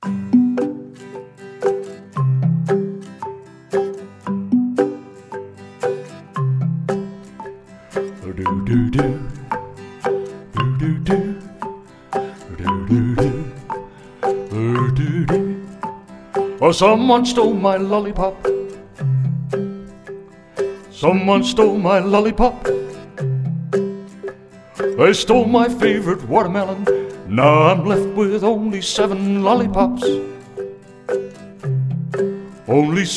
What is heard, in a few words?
Vocal